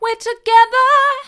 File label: mid, vocal